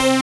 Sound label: SYN DANCE; Sound & Vision - Gigapack II